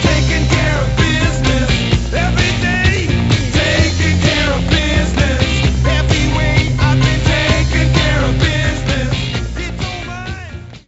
Ditty; NBA ShootOut 2000; PS1

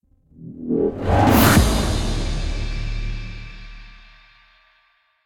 stinger hero appear; roguebook

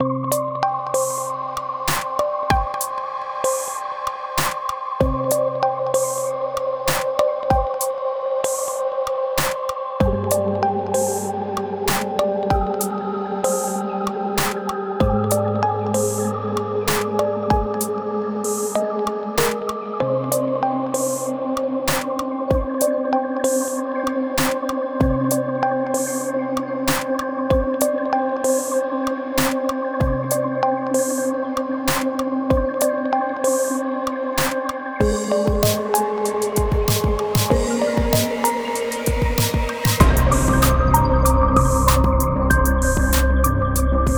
Cute Forest; Flewfie's Adventure

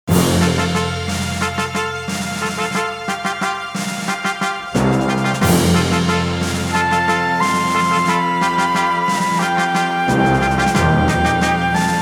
Fanfare; Advance Wars 1+2 Re-Boot Camp

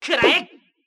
slash; sfx; goemon; Mystical Ninja Starring Goemon

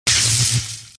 Sfx Voltage

